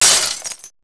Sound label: bottle smash, glass; breakable